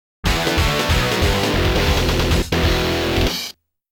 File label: Victory message; Garou Densetsu 15th Anniversary Box